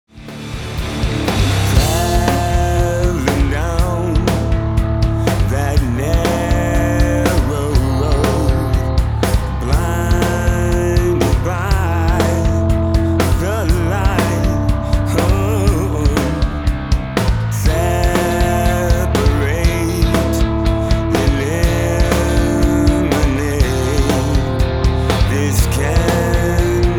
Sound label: Electric Guitar